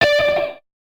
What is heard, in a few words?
Guitar Note; Curse Crackers For Whom the Belle Toils